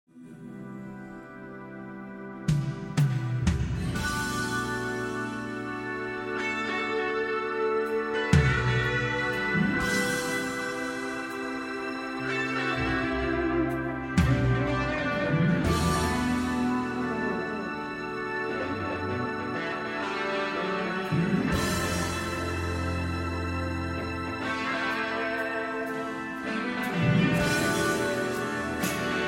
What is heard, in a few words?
Solo Reverb Room Mix